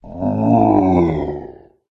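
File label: hydra attack voice middle; gidra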